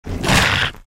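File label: Worm attack mid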